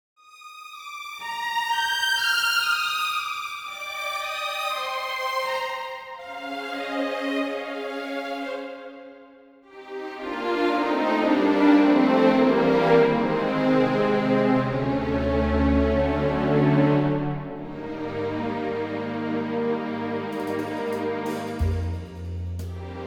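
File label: orchestra F; key - F - vocal range - C to C#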